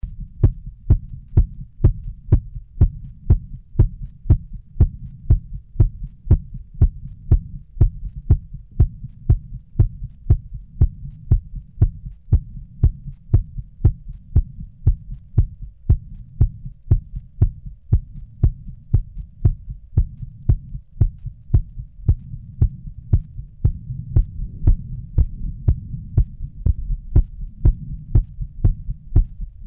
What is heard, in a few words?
Heart Beat After 20 Min